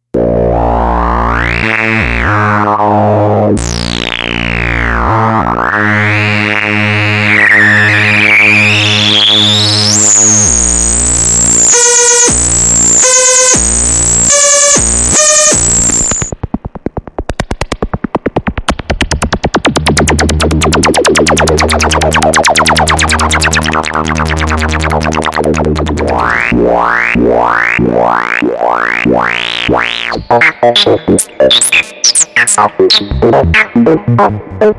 Polivoks VCF; Harvestman

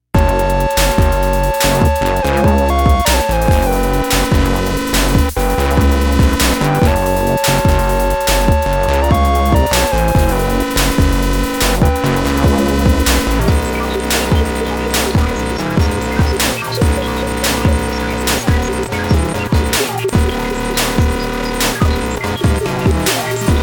EML Electrocomp 101

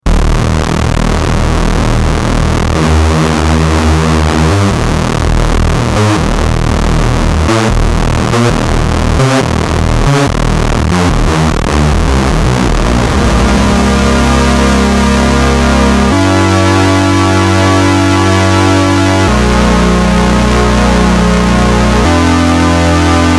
Oberheim 2 SEM

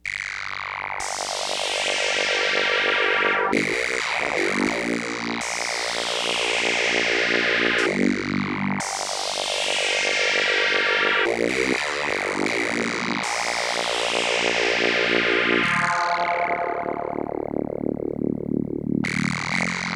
CS50 Chorus

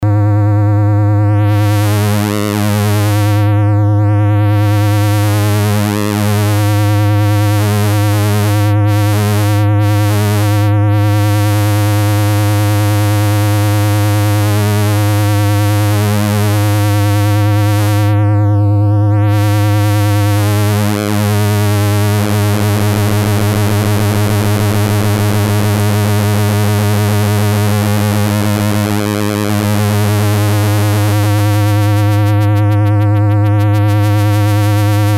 261e PWM; Buchla

